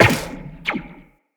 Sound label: Step Zipline, Footsteps Walk; Characters; Tenno